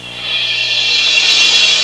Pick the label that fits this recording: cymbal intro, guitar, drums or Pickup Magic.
cymbal intro